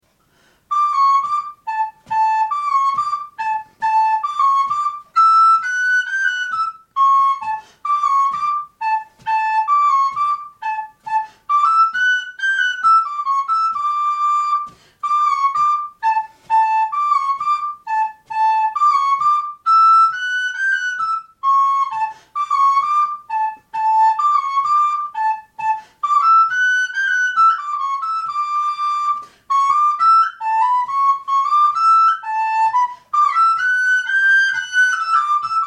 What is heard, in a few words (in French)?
ayre, FLUTE